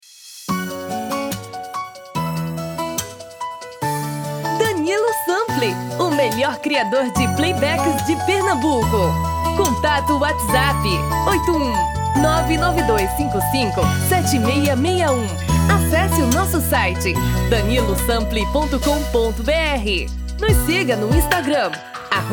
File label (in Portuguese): Brega tom feminino